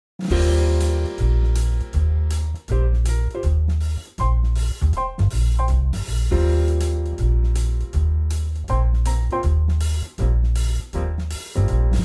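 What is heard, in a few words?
Eb trio, Backing Tracks for Male Vocals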